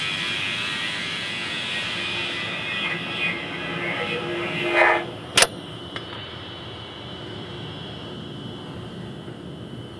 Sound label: interference; Звук настройки радио